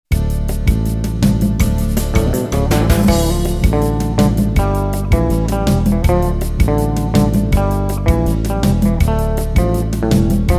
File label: Latin Music Instrumentally